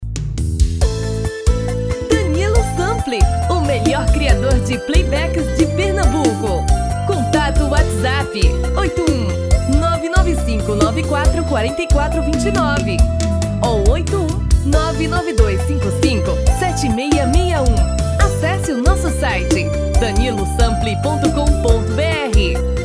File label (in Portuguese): POTPOURI